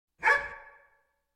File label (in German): hund, Tierstimmen